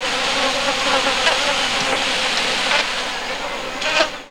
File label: jungle, Insect Ambience; SFX